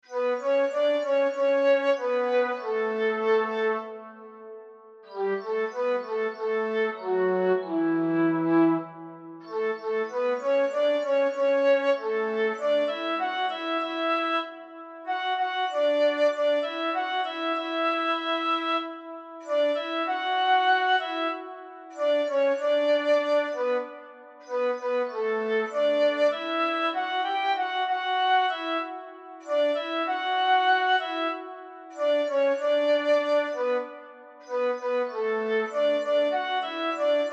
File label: Karaoke Ko e Otua Lahi